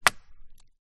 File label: impact stick hit tree trunck